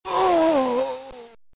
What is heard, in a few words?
groan; pulseman